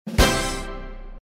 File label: 7 hit; Fantasy 777